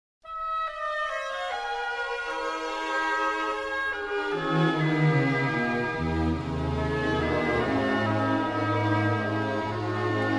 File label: Israel Anthem